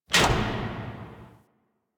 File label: Worker Lever Push; ambience; props; one offs; Warframe; Venus Open World; Venus Solaris United